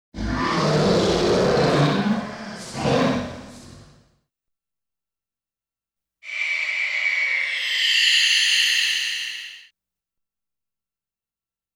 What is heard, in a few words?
Zord Sounds; Saban; MMPR Soundbites